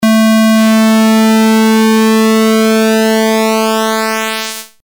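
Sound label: pulse width; nord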